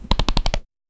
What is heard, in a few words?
one sound when hovering, card game